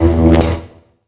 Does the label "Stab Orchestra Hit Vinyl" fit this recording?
No